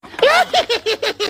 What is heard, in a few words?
laugh